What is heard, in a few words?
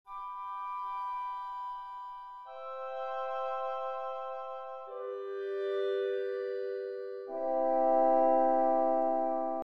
woodwind; Nikolai Rimsky Korsakov